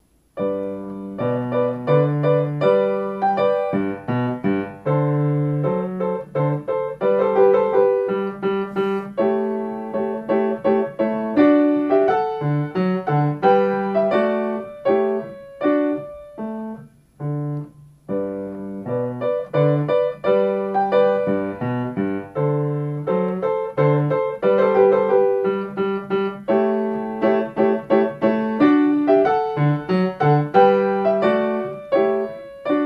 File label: Minuet Piano Pieces for Children; Mozart; Don Juan